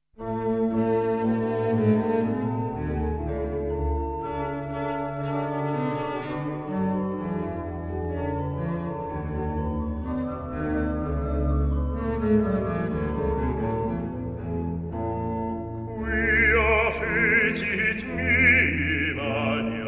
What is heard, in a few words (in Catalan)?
baix, coral